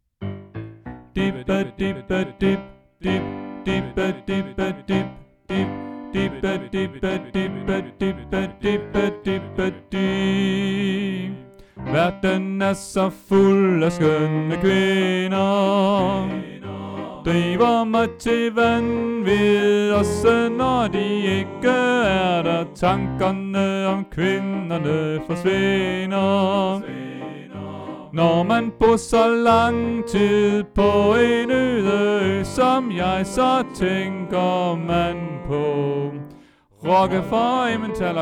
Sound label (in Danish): tenor; oevefiler